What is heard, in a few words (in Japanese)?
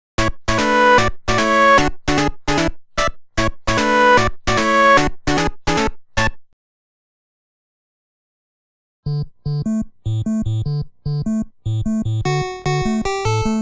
オープニング ／ メインテーマ; ナゾラーボール全ゲット!; ROM Cassette Disk In SUNSOFT Disk System ver.